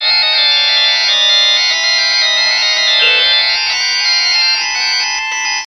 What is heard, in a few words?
organ